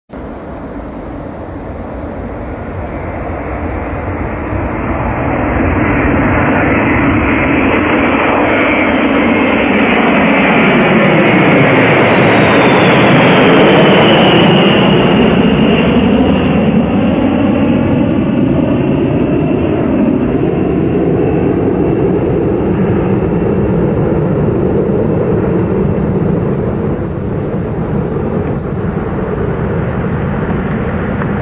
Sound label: usaf b52h takeoff; egva